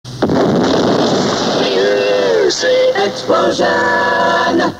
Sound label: Music Explosion